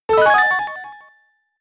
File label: In-Battle Held Item Activate